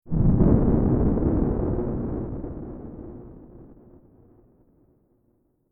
Explosion Warfare SciFi Tonal Large, Explosion Large Tonal Blast Distortion; Explosions